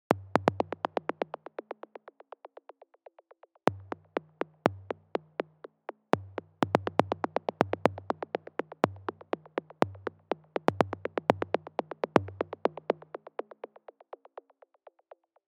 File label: 122 BPM Clicks Rythms; mmb drum arsenal vol 1